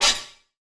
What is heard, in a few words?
Multimedia Internet CD-Rom Flash Element